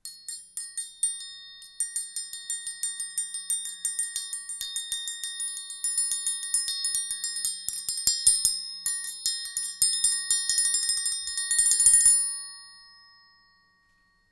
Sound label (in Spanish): Triangulo Suspendido